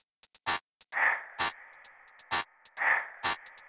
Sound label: Beat 130BPM (Drums No Kick), techno